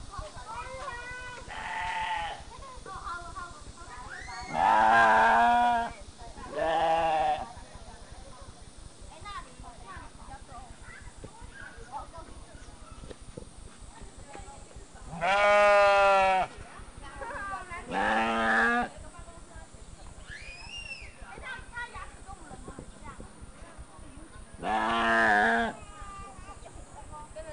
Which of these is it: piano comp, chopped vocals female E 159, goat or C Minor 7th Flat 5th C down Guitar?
goat